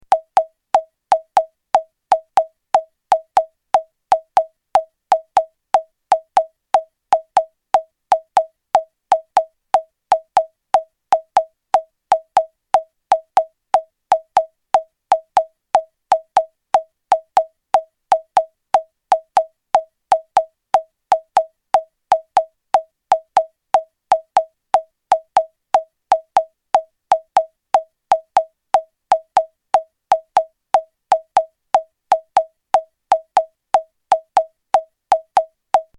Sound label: ringtone